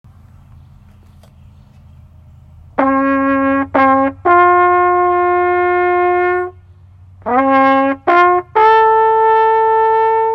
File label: Taps on Memorial Day; Columbus